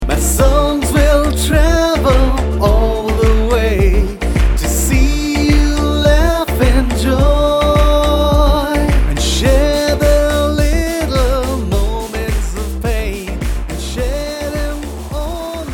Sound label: MISS YOU ENGLISH MALE